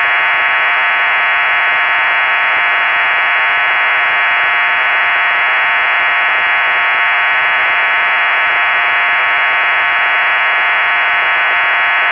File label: CIS 12x120 Bd (PARALLEL), AT-3004D MODEM; CIS12CH-TFC